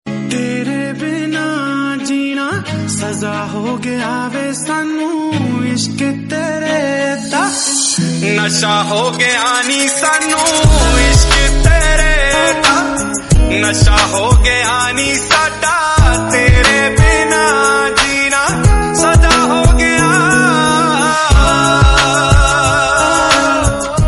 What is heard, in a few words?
Punjabi Romantic, ringtone